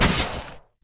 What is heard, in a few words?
Explosion Frost; Etfx